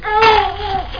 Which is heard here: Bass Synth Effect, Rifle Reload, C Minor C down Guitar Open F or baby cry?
baby cry